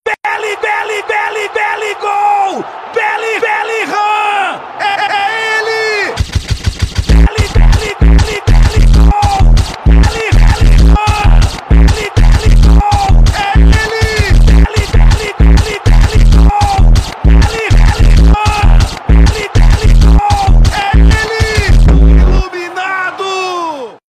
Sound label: new song